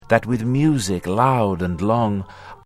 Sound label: music long